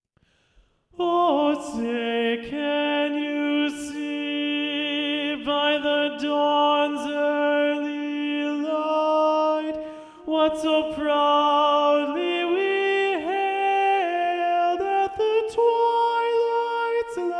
ALTO 3 part Mixed Part 2 Predominant; The Star Spangled Banner

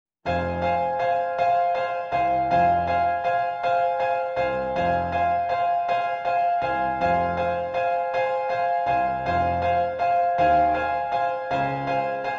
PIANO ACCOMP SATB Accompaniment Track

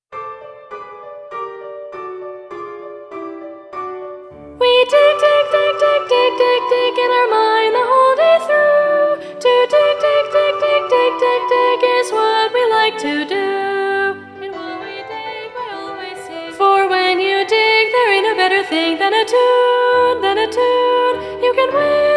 SOPR SA Soprano Predominant

